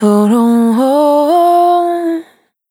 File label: Anna Banana Dry, Vocal Samples, Single Voice Melodic Loops; Singles